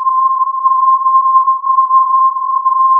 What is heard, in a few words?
filtered input